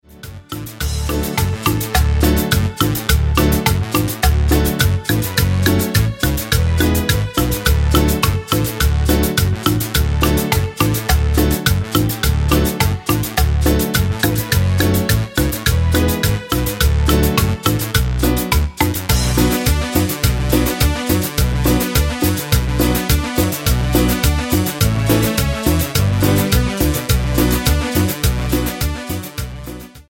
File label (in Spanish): cumbias